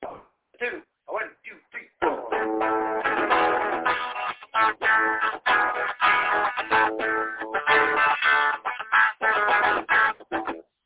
plain happy punk ish with a 7th

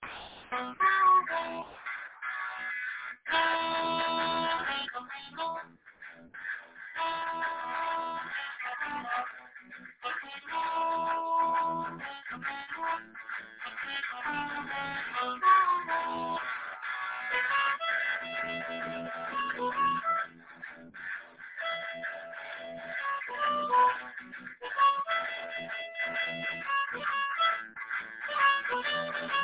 medium 8 bar blues

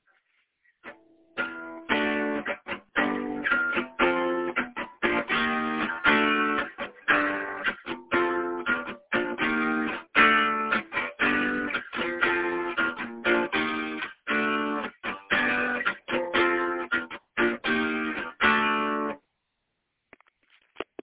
heavy

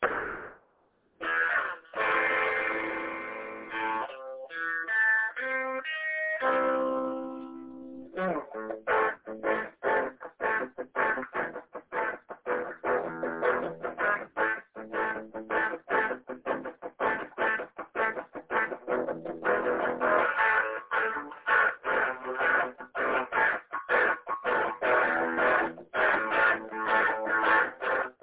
classical style metal

